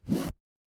paper fabric zipper

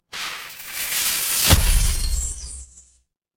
magic scifi portal

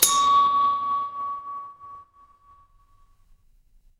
instrument bell

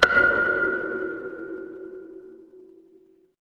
instrument wood block

